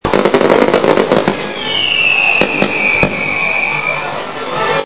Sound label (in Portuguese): Fogos